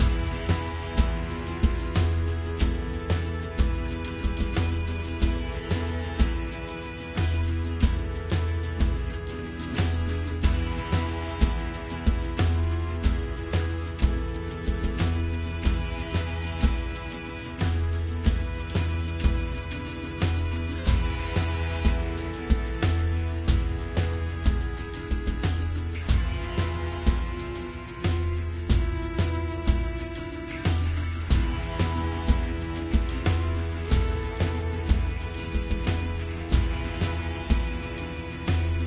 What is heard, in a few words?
Combat Low Loop; assassin s creed chronicles india